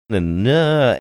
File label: funny vocalization